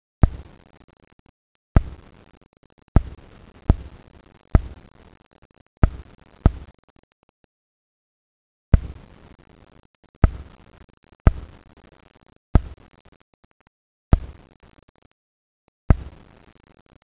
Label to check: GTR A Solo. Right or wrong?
Wrong